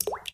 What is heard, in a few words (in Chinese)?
可爱水滴